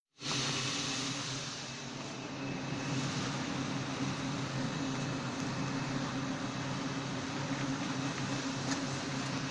Park

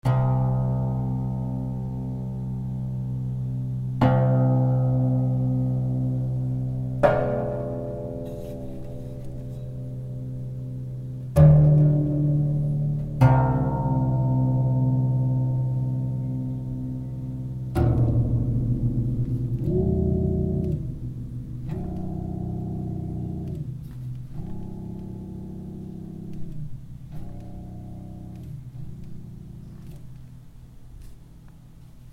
Stellradharfe

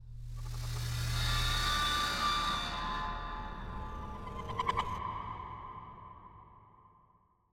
geste mit hall

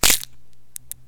Blatt

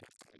klick

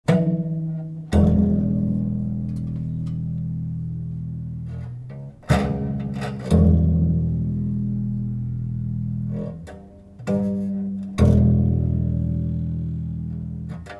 Rohrbass

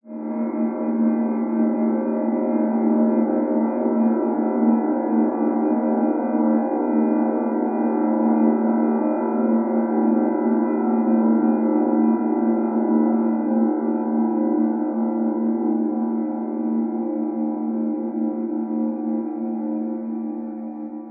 Stretch welle

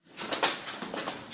Park

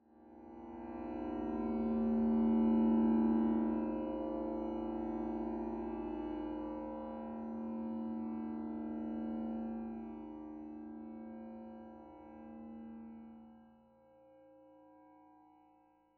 Stretch welle

